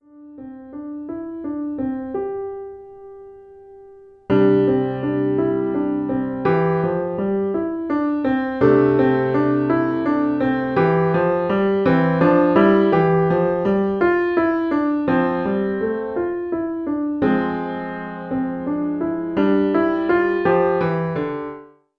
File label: elementary piano solo